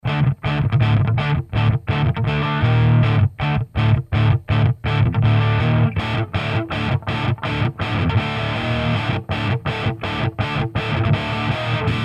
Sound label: clean clean boost dist dist boost; JFET